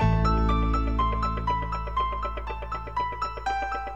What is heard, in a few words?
piano, sad loop